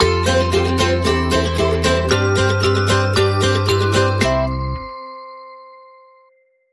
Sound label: Upbeat Acoustic Christmas No Drums Sting